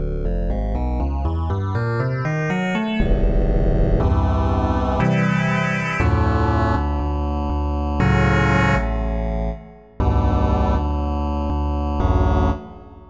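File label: Flanged Feedback, fuzz guitars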